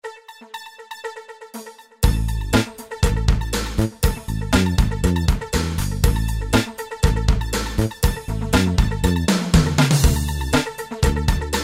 good mood

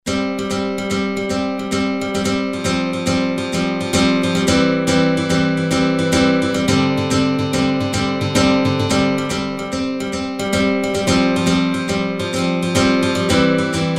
guitar multistring; ramirez

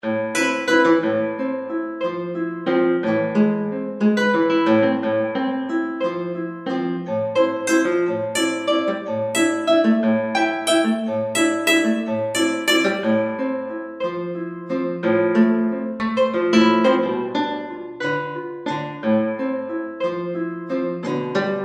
nylon fleta guitar full

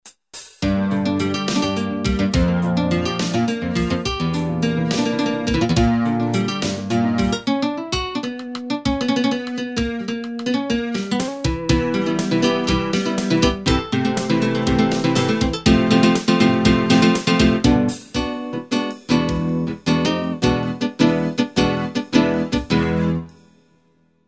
nylon guitar stereo

